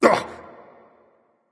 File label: grunt hurt; smite; voicepack; osiris